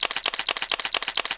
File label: Continuous Shot, camera; Optimus 2X SU660; LG